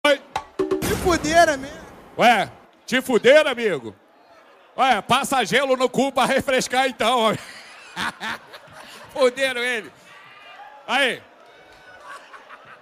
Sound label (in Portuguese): apresentacao ao vivo na roda de funk